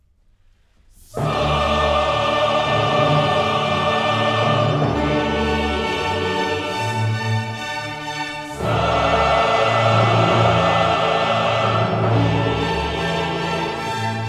Requiem for soloists, chorus, and orchestra: Sanctus. Adagio - Allegro; Mozart